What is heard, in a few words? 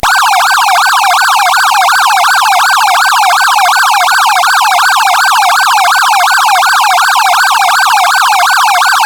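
YELP1 PIERCER, LED warning bar; UltraLITE Plus Exterior LED Warning Bar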